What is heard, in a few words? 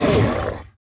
warrior hit; ScorchedCanyon